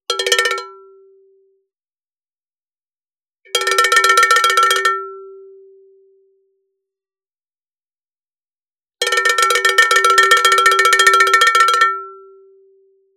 Cowbell hit inside with drumstick; SkewSound; The Fool

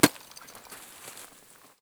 crackle ice; Sound Design Tools; Raw Foley

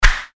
Fighting Swing Sound; FXHome

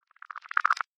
Tech, Weird Side Samples

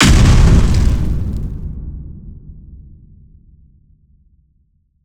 Deep Explosion; Stefano Cremona; Explosions

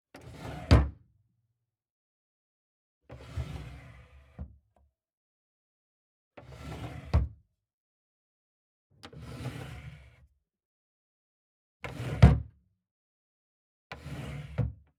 Nightstand Modern Drawers Wood Close x6; Soundopolis; Household Doors HD

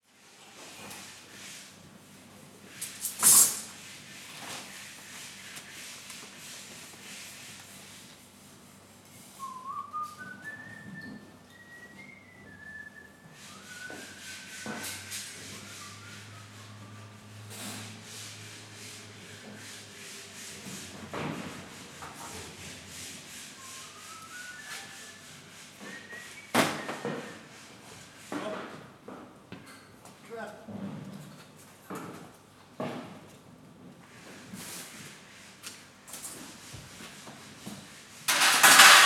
South African Ambiences Interior; TheWorkRoom Audio Post

